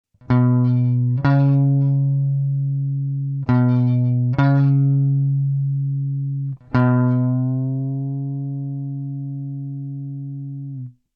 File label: bend